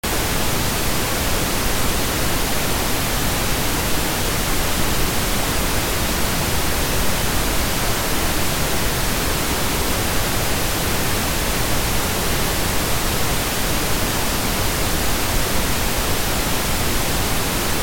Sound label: pink noise